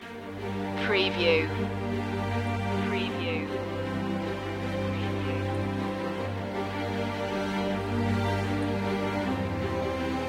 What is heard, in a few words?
strings